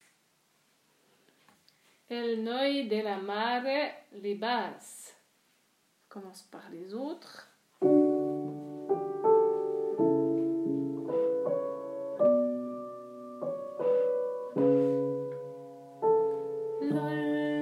El Noi De La Mare Basse

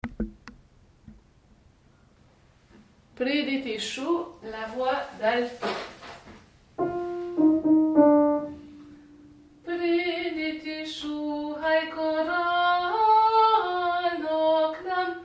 pridi ty alto

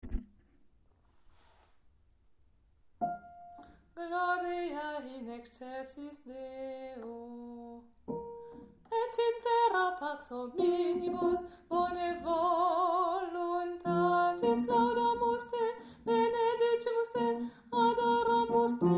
Haydn gloria basse

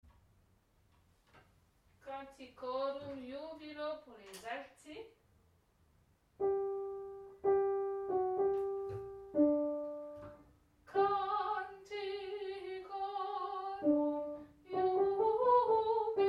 canticorum jubilo alto

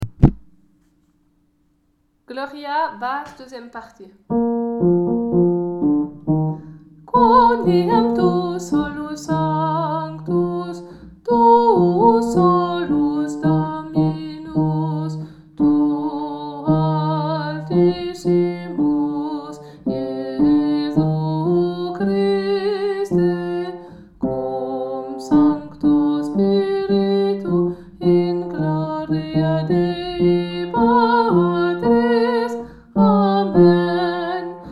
gloria basse

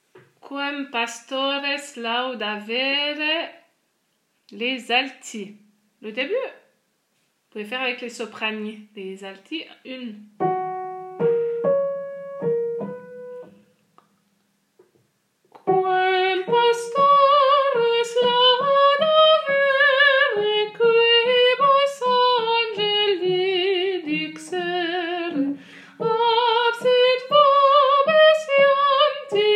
Quem Pastores Laudavere Alto

